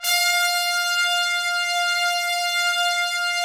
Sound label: Trumpets, BRS TPS 2JR; Roland - LA Composer vol. 4